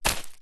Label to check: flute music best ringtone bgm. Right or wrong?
Wrong